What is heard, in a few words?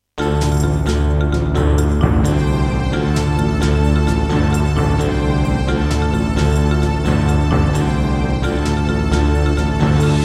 Kawai GMega, wavetable; Heretic